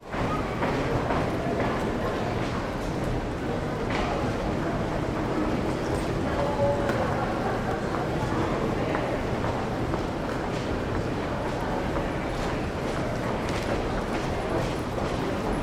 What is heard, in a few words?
ambience london underground pedestrian tunnel busy announcement busker flute